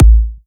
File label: Punchy Kick